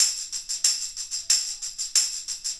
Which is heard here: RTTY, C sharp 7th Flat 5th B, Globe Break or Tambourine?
Tambourine